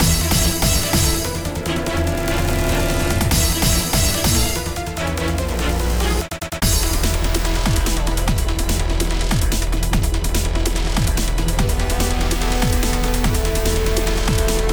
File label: Boss Battle